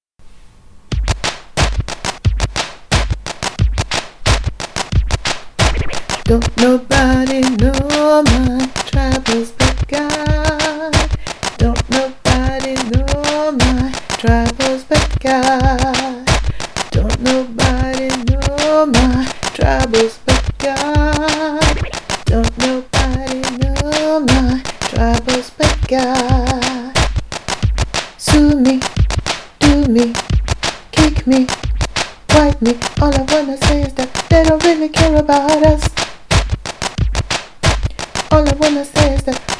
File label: ALTO